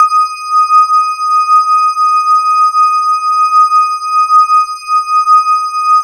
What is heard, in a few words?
E-HARP, DX HARP; Wizoo - Powered DX